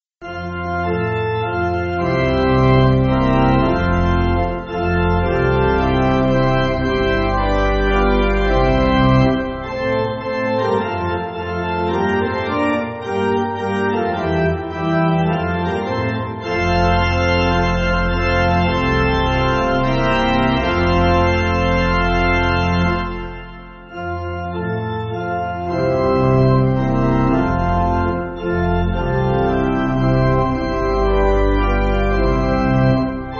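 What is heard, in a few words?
Pipe Organ, church backing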